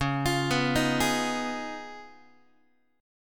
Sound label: C sharp 9th C sharp down Guitar, chord; C#9; arpeggiated; JGuitar